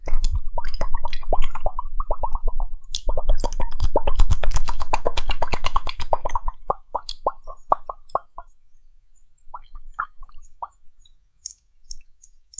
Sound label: the thick viscous liquid